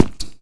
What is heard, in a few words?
tile; svencoop; AoMDC